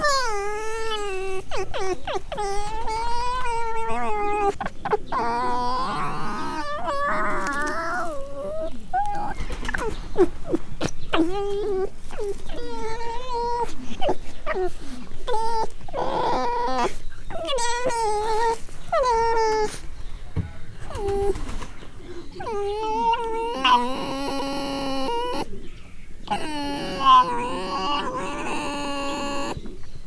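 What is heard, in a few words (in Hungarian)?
tülekedés ért kuncsorog, vörös róka; miskolci zoo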